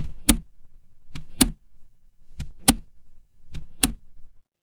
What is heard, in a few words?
mechanical switch